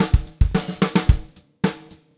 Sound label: Black Maple Groove 110, DW Black Maple Kit, 110 BPM, Drums; Sonic Foundry (Sony Creative Software); Vital Drums